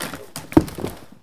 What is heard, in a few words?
tackle; LEGEND98